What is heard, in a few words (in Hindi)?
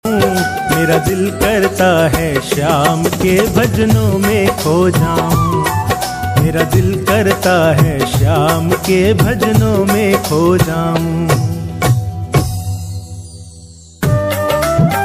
Bhajan Khatu Shyam Bhajan